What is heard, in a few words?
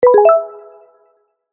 App Notification